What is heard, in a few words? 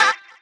Fx Vox; Equipped Music; Loungin House